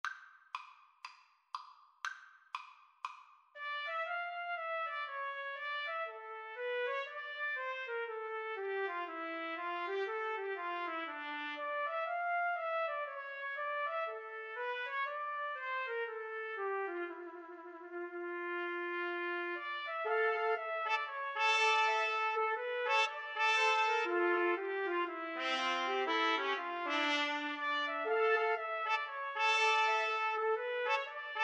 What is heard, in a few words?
2 Trumpets 1 Trombone; bach bouree